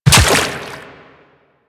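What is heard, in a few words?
step on water, footsteps; Warframe; The New War; Kahl; Quests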